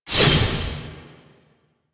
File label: Archwing Scythe whoosh; Melee; Warframe; Weapons; Exalted Archwing Scythe